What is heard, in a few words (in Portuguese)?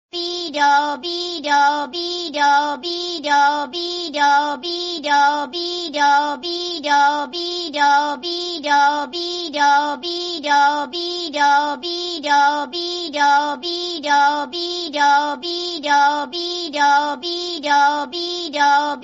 Alarme De Fogo; Minion